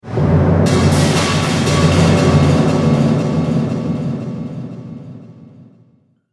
Drums Cinematic Stinger; Sonic Logo Sound Effects

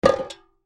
Moon Shine Jug Set Down Hit; Set Downs; Objects; Blastwave; Foley

